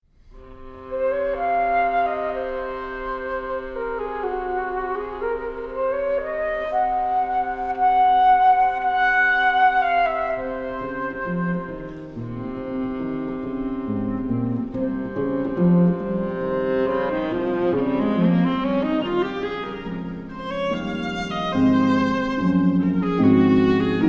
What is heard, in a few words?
Sonata for Flute Viola and Harp Interlude